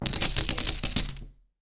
Poker Chips Stack Of Chips Dropping On Felt

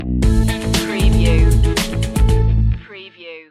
fancy mood stinger

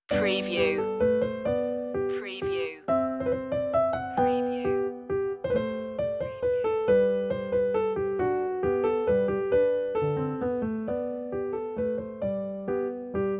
minuet g major; petzold; bwv anh114

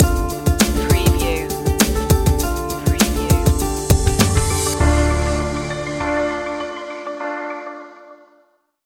tribal trip hop stinger

